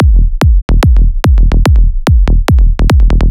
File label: KICK BASS LOOP 145BPM E; SYNDRM; Patterns PM E mix